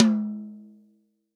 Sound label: REAL DRUMS POP KIT